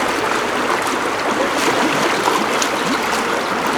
stream, ambient; RallyC; CD-Gamer 2000-05

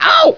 BUMP; Screen Wreckers

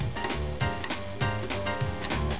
loop

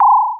beep; MOTS

